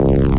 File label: door; opensurge